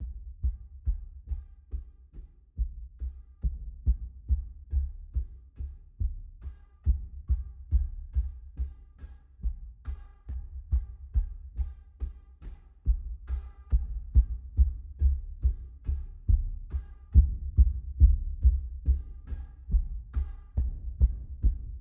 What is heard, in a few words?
Glimmer Underscore, Cinematic Inspiration; Narrative Mind